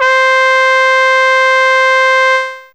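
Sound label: Trumpet Mid C4, Fairlight CMI IIe; Brass; Brass1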